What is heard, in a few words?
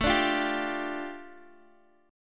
A 7th Suspended 4th Sharp 5th, A, down, Guitar, DADGAD